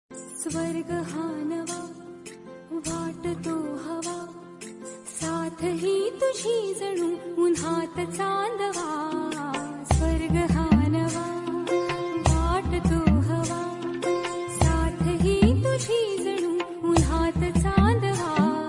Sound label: Marathi Female Ringtone